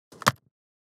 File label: landed on the ground